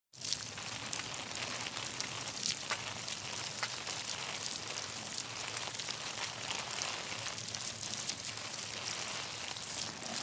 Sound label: Watering plants